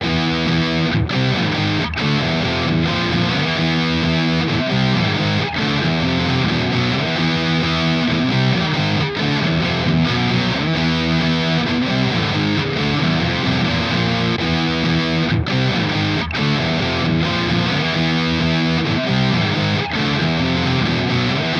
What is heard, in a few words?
Distortion; Audiovergleich Mooer Nux